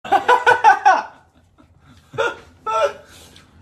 Laughing; Nunu

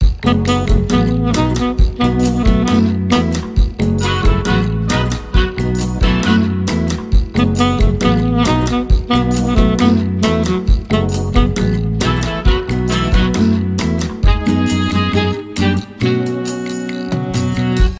Bossa

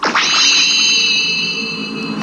Saint Seiya Effect

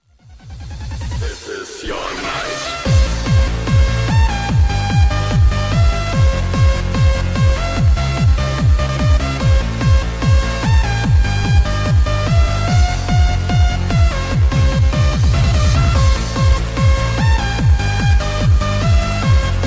Trance

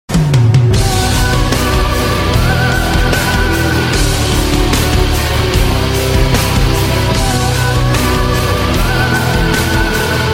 Instrumental

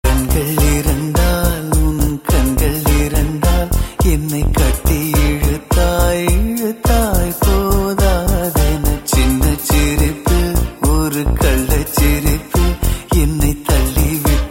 Male

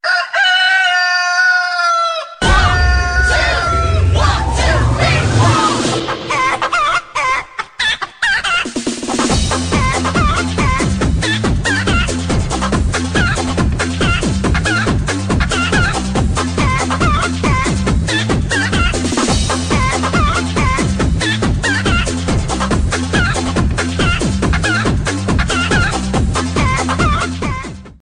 Chicken Fun; HTC

